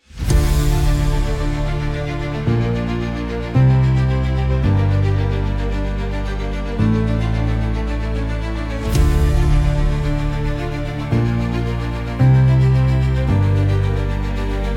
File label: intense emotional motivational music